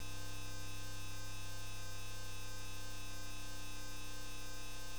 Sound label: Noise